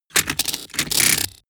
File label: sci-fi electromechanics small stutter